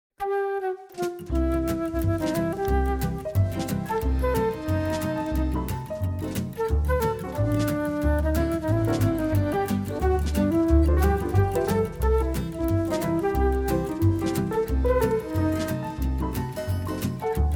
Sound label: Flute Swing